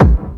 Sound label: kick